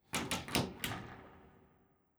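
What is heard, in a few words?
ambience generic sounds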